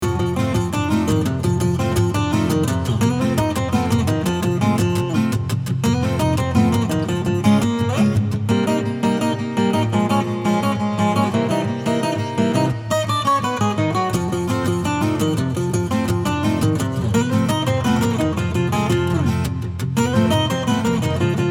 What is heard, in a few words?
Acoustic